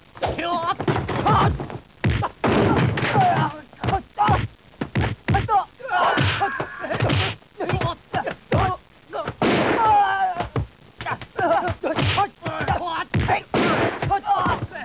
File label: Fight; 特酷声音素材库